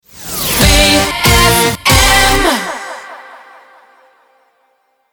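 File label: 140bpm G minor Rapid Fire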